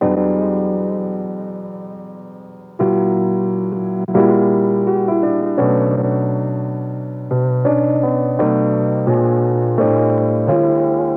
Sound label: Piano Dry 86 BPM B Min; Melodics; Cymatics - Eternity Sample Pack